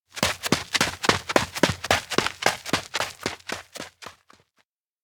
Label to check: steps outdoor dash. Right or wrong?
Right